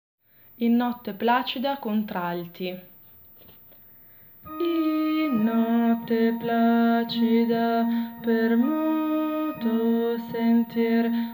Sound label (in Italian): contralti; In notte placida